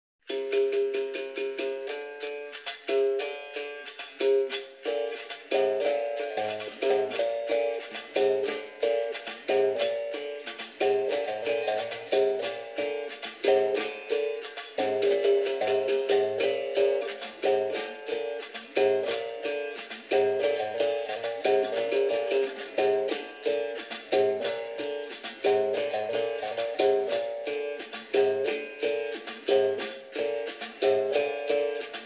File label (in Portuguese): Toque de benguela, capoeira